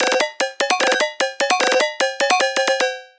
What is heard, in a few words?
Semi-Tonal Riddim